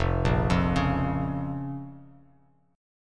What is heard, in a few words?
F Suspended 4th Sharp 5th Bass Standard, chord; arpeggiated; 1,1,3,6; JGuitar